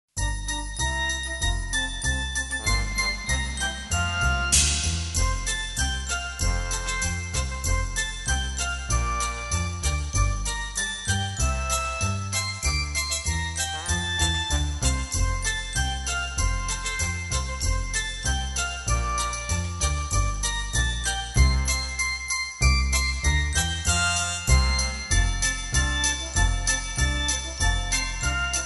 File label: jingle